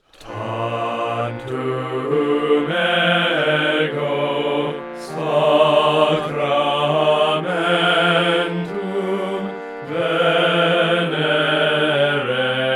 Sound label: bass with INSTR